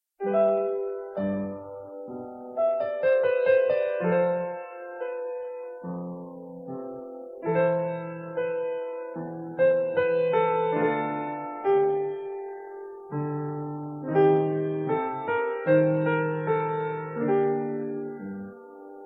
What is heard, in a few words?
pieces for piano